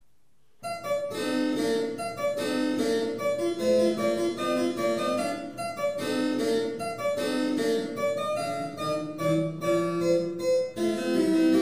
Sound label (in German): Cembalo; LSKB KV Anh 109b; mozart